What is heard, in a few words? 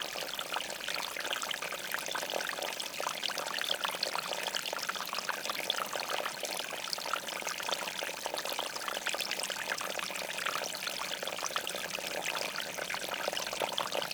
Meltwater Drip